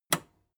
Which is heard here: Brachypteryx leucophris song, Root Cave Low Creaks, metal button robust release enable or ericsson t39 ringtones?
metal button robust release enable